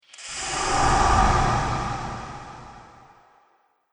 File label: Pyramid Phantom, ghost sound effects; Phantom Sound Effect - Surreal Ghostly Apparitions; Andrew Fleming